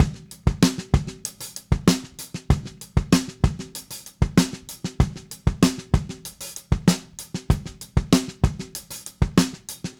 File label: Drums, 70s Analog Funk; Sonic Foundry (Sony Creative Software); What it Is! 70s Analog Funk